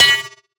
Multimedia Internet CD-Rom Flash Buttons Electronic